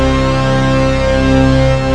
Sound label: 4 OCTAVES